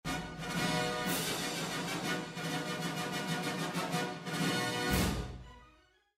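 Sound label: mexican trumpet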